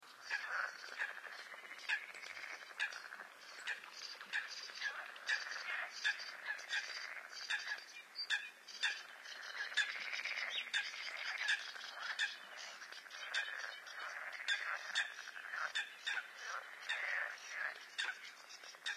ambient swamp night loop

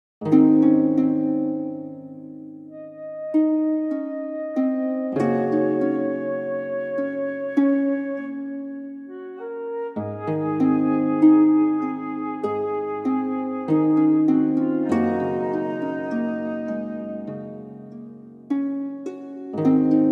tavern music

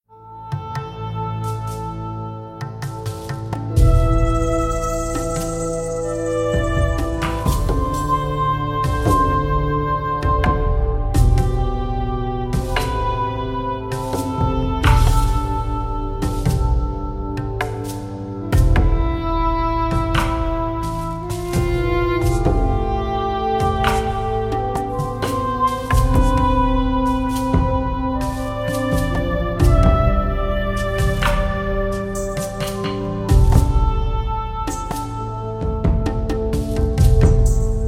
earthrise ambient music

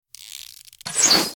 bow autoattack cast; abilities

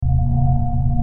haste warmup

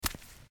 biped run

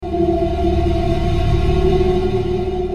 swarm warmup

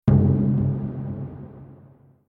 warning

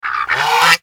quack a trice attack; mobs

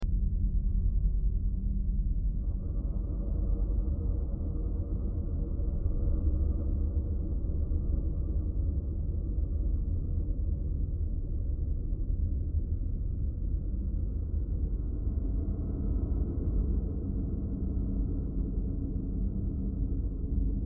ambient spooky drone

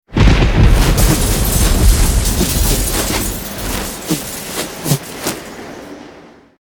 froststorm effect; abilities

